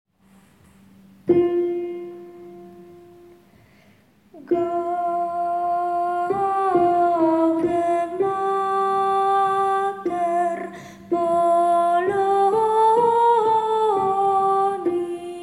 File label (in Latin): Gaude Mater vocal